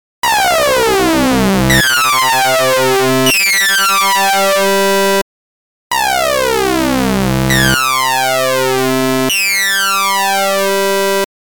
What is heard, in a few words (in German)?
Sync ohne und mit